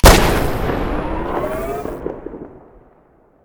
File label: gauss comp shoot stereo, weapons